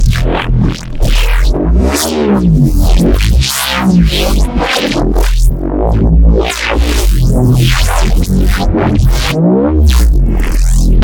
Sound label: Neuro Massive Reese